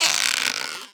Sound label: Balloon squeak